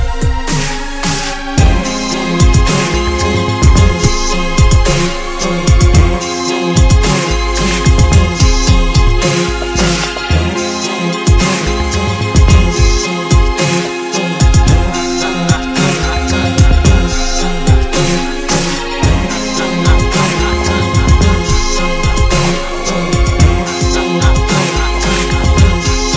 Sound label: Tribal